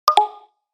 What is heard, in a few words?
cashout